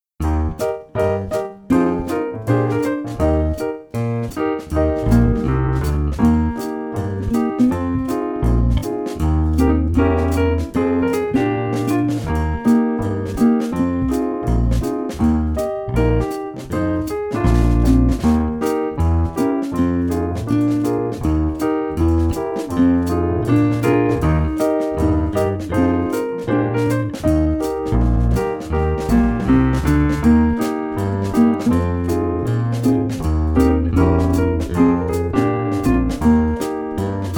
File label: saxophone; mib